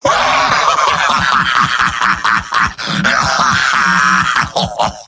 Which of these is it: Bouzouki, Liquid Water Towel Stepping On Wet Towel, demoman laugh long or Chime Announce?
demoman laugh long